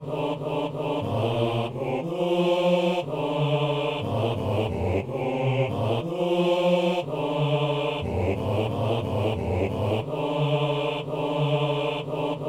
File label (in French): messe simple, gloria, partie a seule refrain, basse